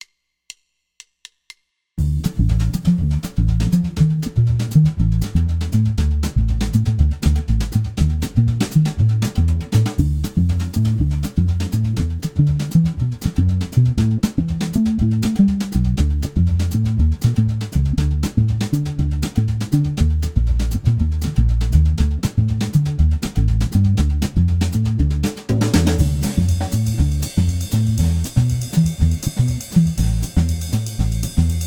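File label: alt style no piano guitar, jazz; all-state jazz audition set ii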